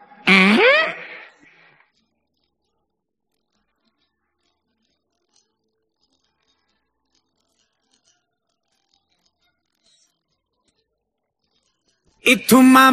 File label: hmmmm and part of song